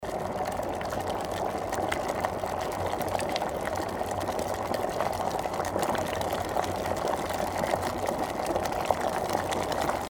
zvuk kipenija

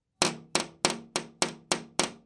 stuk v okno zvuk

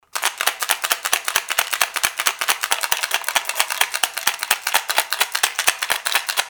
zvuk trjaski

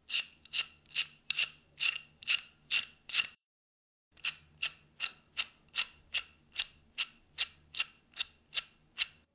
zvuk zakruchivanija

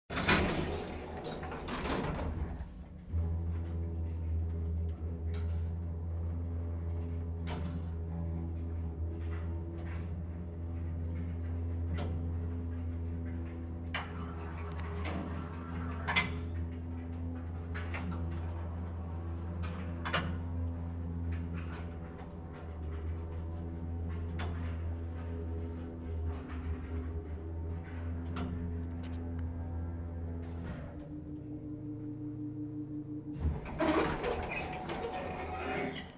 zvuk lifta

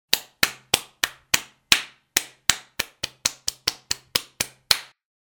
zvuk ladoshek

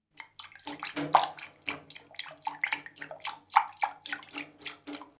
plesk vody zvuk

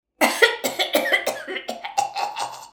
zvuk kashlja

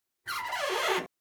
skrip reziny

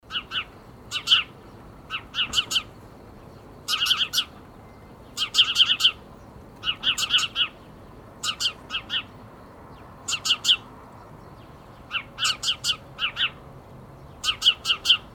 zvuki ptic

